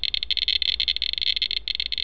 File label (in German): glas